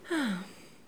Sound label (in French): ah soulagement